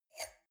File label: wine glass grab table slide soft; berufe; gastronomie; soundpool